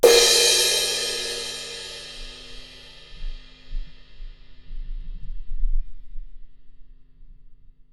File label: Challenge crash, Drums Cymbals Crash